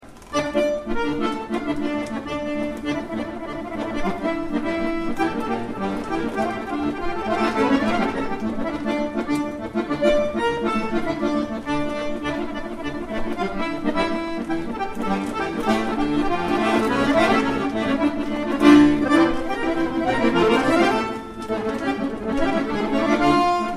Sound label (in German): Akkordeonsolo; Frühjahrskonzert 2010